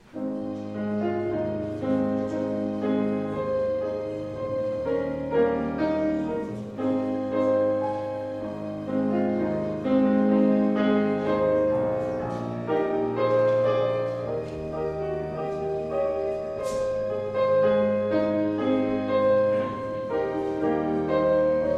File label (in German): Vorspiel